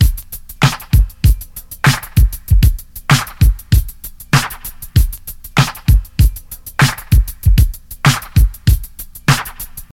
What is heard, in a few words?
97 bpm 00s drum loop g sharp key, Free drum beat - kick tuned to the G# note. Loudest frequency: 1350Hz; drum loops